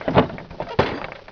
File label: warehouse; CAESAR3